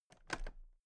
toolbox latches open regular

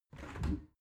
window open regular

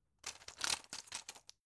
plastic blister handle

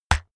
keyboard external key hit push

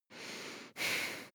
breath female heavy calm down

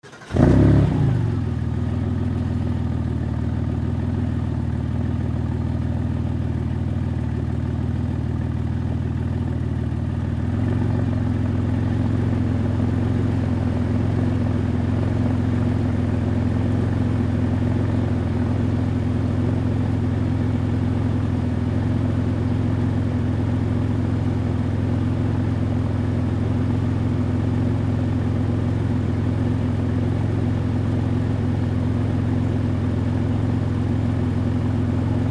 porsche start and stop

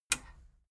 metal button radio release enable

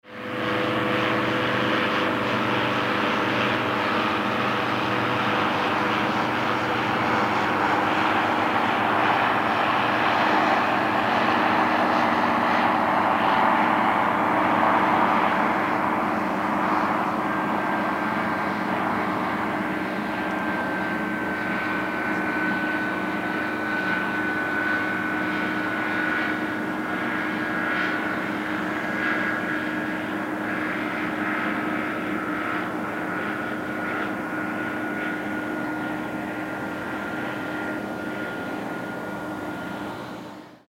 light aircrafts passing by

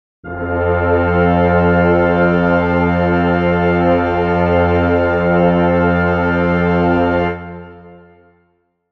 brass chord f major

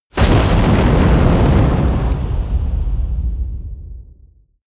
missile large fire stationary close

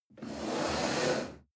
chair wood slide on floor medium

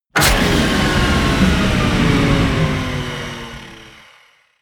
wind down

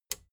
plastic button small latch release

